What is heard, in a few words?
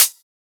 Closed Hat; FLPRO; Closed Hats